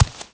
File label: walk l md, FOOT; The Elder Scrolls III Morrowind GOTY; XBOX